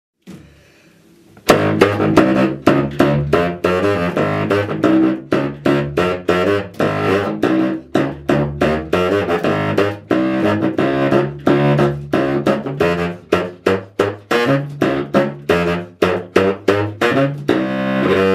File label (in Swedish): bassaxofon